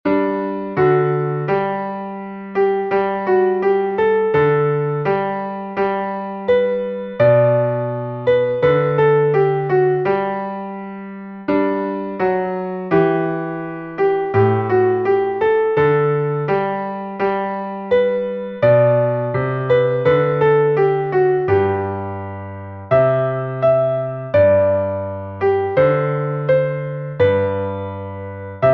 bass; once in royal davids city

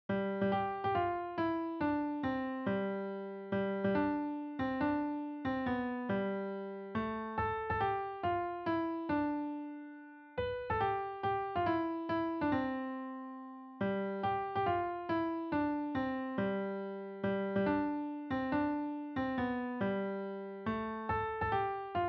melody, piano

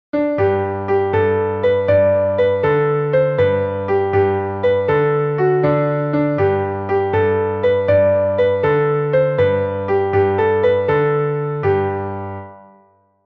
bass; i saw three ships

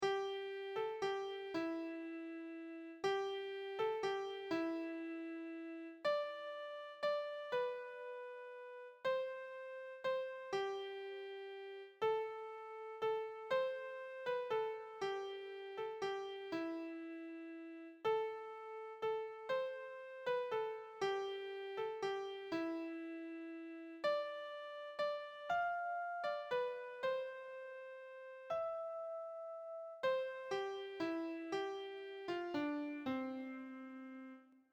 melody only; silent night

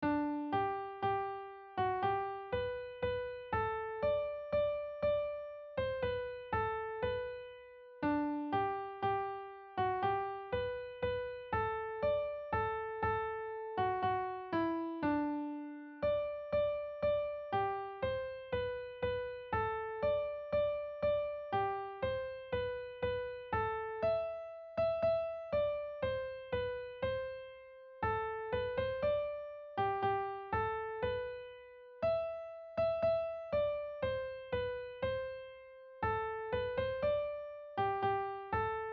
Hark the Herald Angels Sing Melody G Major

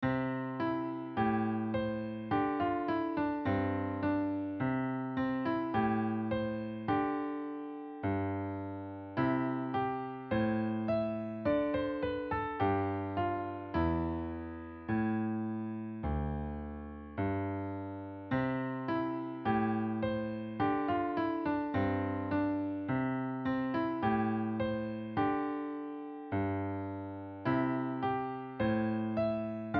bass c major

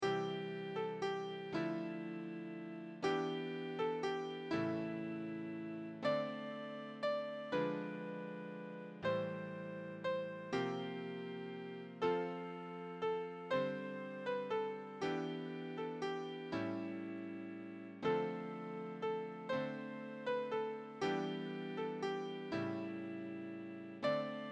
silent night alternative chords

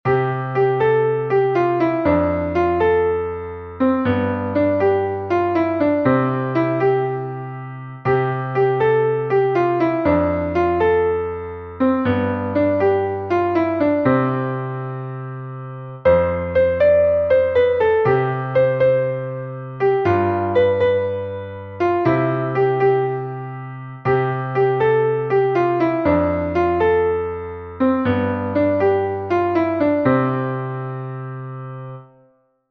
bass; see him lying on a bed of straw

